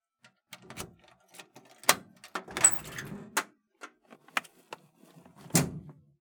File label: locker; 鍵を開けて開き、閉じる音